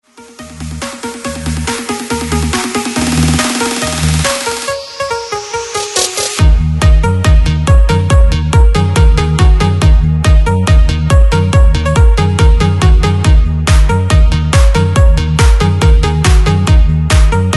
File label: Vina Bounce 140 Bpm